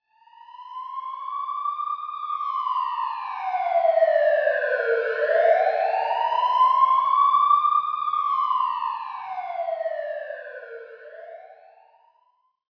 city siren by

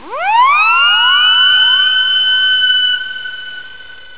siren; kart race

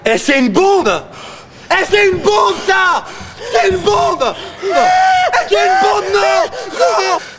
bomb; ExPlOsIvE v2

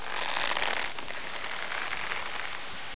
rope

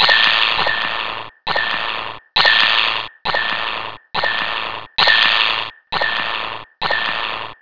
scream; mystic radar

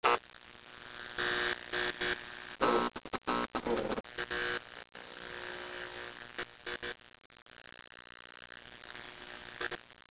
buzzer neon; cs paranoia

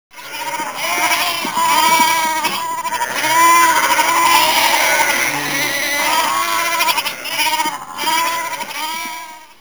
goat; colonial

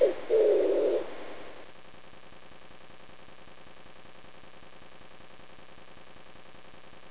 pigeon

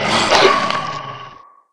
gonome melee; mystic radar; mr zombie1

